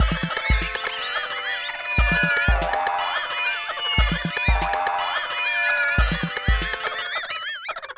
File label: Cartoon